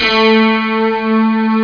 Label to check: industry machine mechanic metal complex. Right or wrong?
Wrong